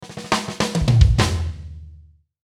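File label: Musical Element, Drum Snare March; Blastwave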